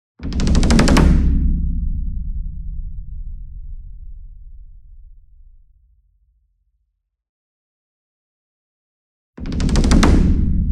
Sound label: SINGLE DRUM Gong Roll Hard Beater Near; boom library; Cinematic Strikes Construction Kit